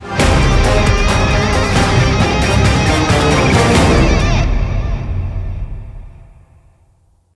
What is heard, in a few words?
Transition Shreddy; World Travelers